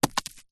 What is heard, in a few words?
stone small land on dirt road small stones